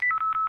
Samsung Batt Low

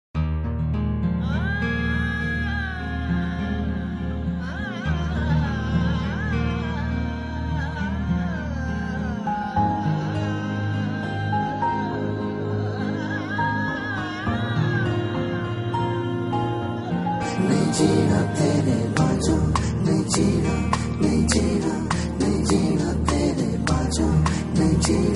Slow Song

